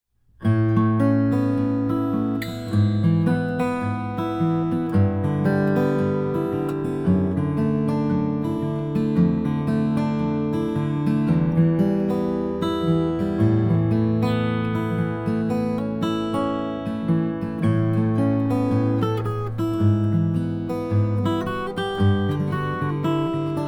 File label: froggy bottom h12 cutaway walnut